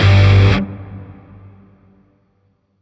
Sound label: Shawzin Maj Chord; Warframe; Grineer Shawzin